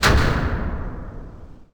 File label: sfx sci fi; Masterbits - Switch FX